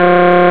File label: autohorn tone; laundry truck; sound effects and sound reinforcement